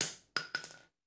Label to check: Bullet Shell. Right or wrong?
Right